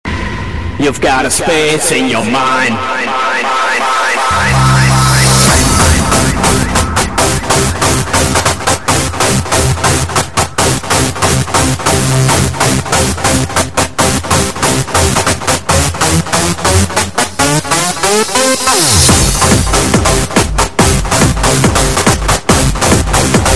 anthem ringtone; mind space

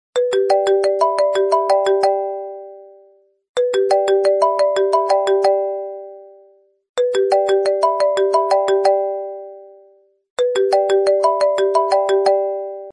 iphone ringtone

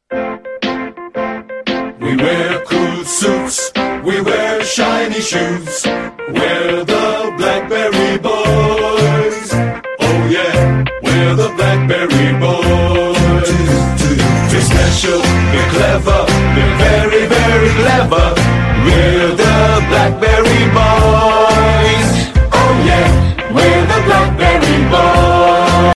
blackberry ringtone

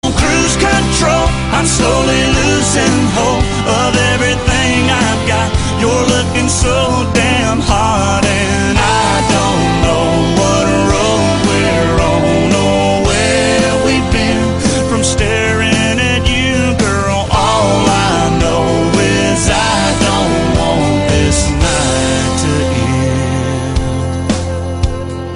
rap song tone ringtone, english ringtones

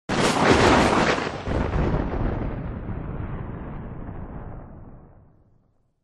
thunder clap, ringtone

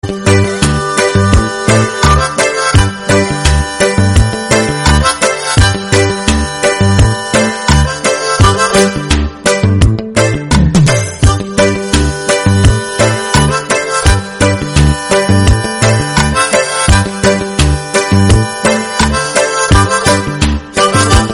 cool background banjo music tone ringtone

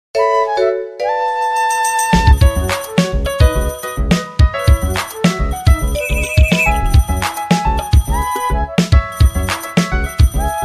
nokia 3120 ringtone; city life

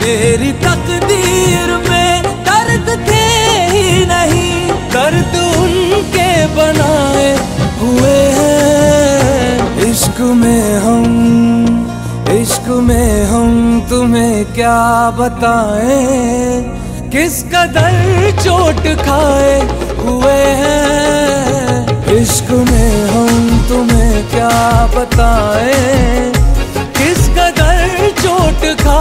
new song ringtone

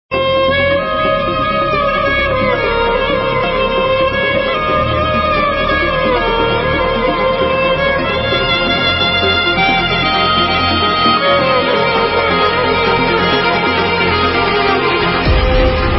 instrumental music for broken hearts ringtone; bollywood

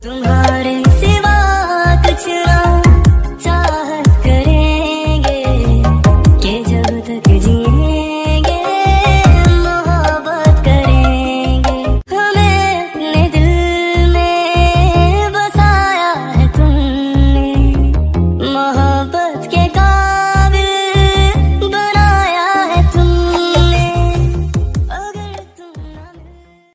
flute instrumental ringtone; bollywood

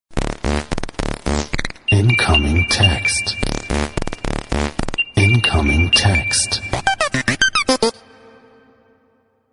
space text ringtone

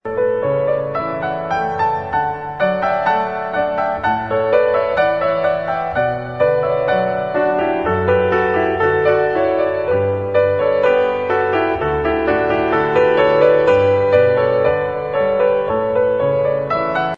canon in d piano ringtone

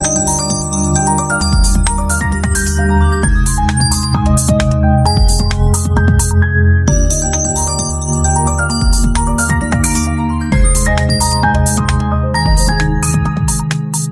redmi note 9s xiaomi ringtone

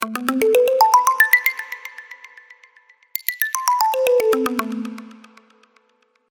tapping ball ringtone

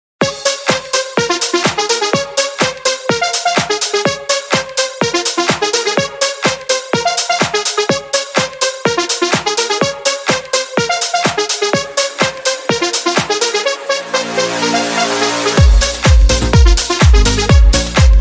clubmix ringtone

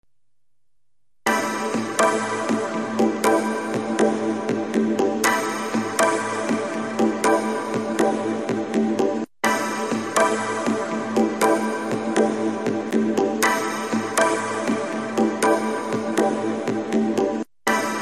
deep ring ringtone

